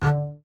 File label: D3 SPICCAT, ARCO BASS